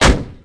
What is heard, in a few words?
crane stop; Tony Hawk's Project 8; XBOX